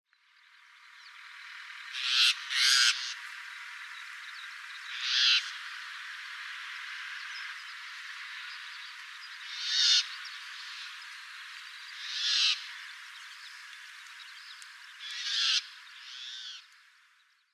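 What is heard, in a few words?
Crested Myna, himeji; hakkacho; koe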